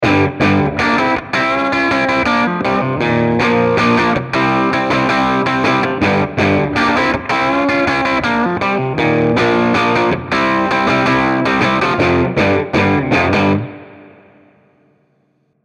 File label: MF Drive Dark and smooth